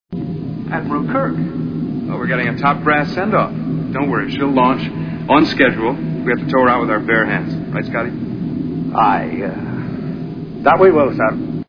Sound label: brass